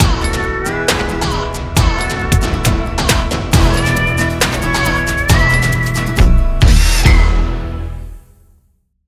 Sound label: Template 136bpm; Wuerth